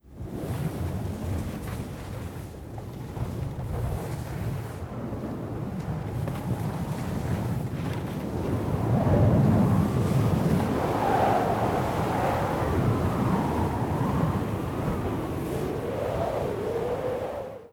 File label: Meteor Crater